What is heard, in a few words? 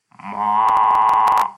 mooooooooo